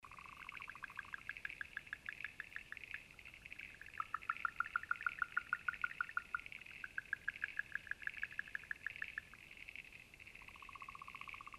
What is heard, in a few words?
Neobatrachus sudelli N pictus Eaglehawk Waterhole